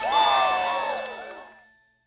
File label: challenge complete; daily challenge